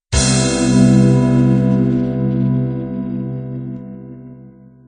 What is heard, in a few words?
pop ballad end